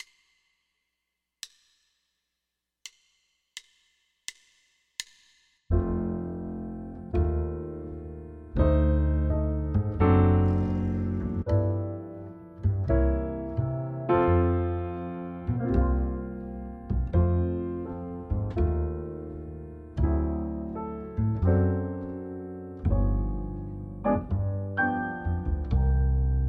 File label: med swing drums play along, jazz; All-State Jazz